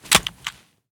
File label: pistol drop grass; STALKER; IWP